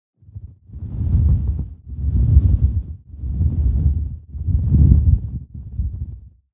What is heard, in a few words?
big dragon